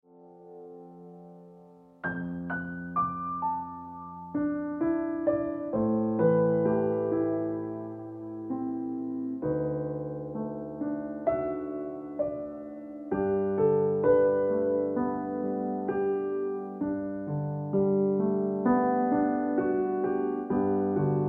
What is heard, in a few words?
Ringtone Piano Music